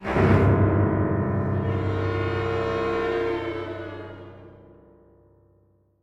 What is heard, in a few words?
String; assassin s creed unity